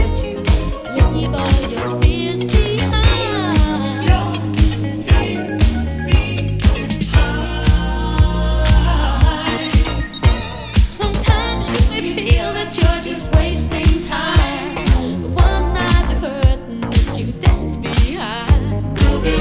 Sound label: Vocal Mix